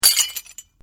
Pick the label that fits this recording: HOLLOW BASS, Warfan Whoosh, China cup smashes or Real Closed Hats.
China cup smashes